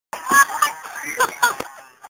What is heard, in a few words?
Baby Laugh